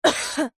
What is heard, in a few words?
human girl teenage 13 years short cough; zapsplat